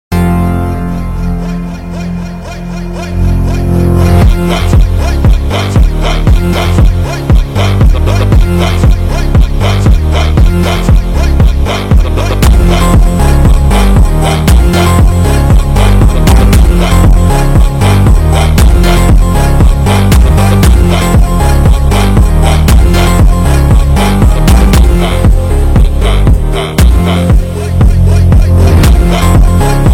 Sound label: Funk Montagem